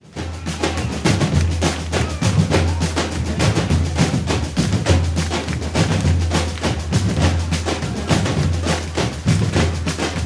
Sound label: Reggae Rhythm